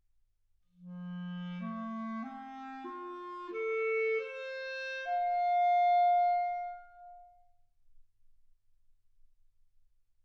clarinet

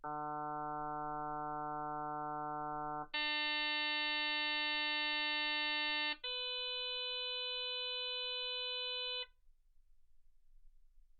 harm sinus 48kHz

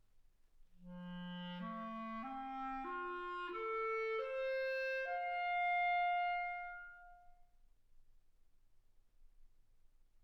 clarinet

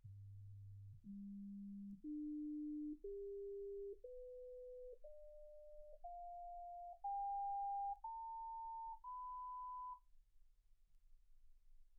sinus tones 48kHz

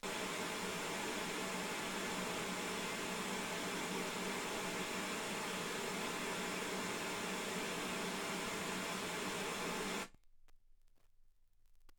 wgn

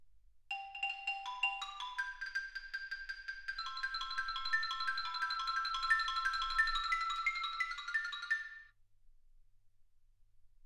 xylophone

